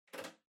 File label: Calculator (Far Switch)